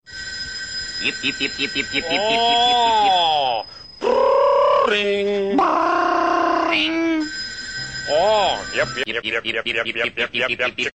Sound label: YipYipYip